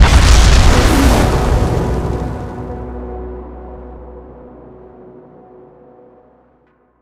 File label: fire death, Small Creature, Explode; boss spawn; Devil Guns Demon Bullet Hell Arena; Monster Within